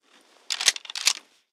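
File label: Weapon rifle sniper jam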